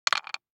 DICE on Wooden Tray, Throw and Roll, Standard, 2 Two Dice; Samples; ArticulatedSounds; DICE Sfx Party (Extended)